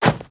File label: Dirt Run